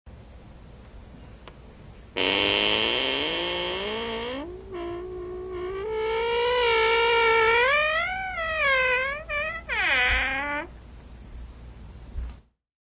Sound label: door open slow sound effect